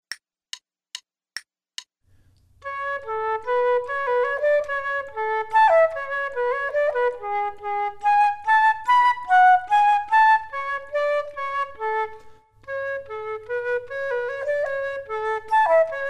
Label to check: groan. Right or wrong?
Wrong